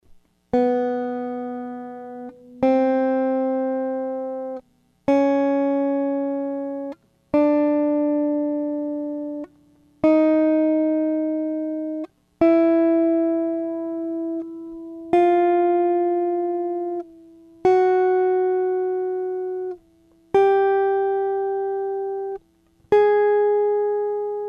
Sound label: yamaha fishman, guitar